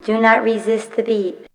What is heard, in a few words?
VOX